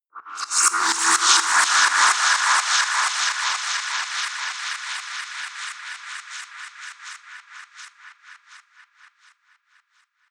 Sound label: sputter harsh crow